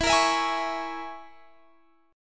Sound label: E 6th Flat 5th, Guitar, Half Step Down